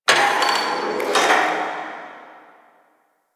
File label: Gas Machine; Ambience; Warframe; Corpus Gas City